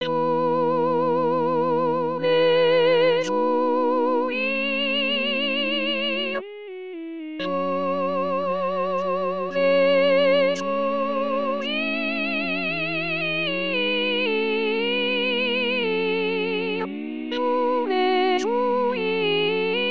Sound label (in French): cris soprano; 2017 st jean